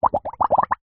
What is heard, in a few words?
nature water bubbles small shallow water plastic straw container short; zapsplat